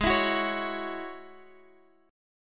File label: G sharp 7th Suspended 4th Sharp 5th, chord; strummed; x,x,6,9,7,9; G#7sus4#5